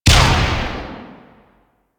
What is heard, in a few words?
Flame Spear Explosion; Grineer